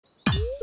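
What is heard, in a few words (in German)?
Spitzhacke Fehlschlag; Stardew Valley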